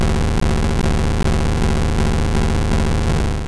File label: Earthquake